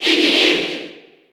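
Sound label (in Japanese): Cheer Japanese; Iggy Koopa; SSB4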